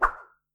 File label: Sword Swing Strong